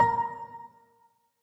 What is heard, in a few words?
piano; osu